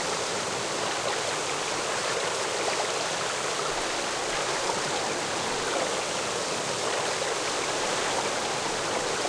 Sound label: Ambiance; Knytt